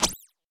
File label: jump plastic; fallguys